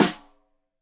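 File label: Snare; Deeply Sampled Drums; Stereo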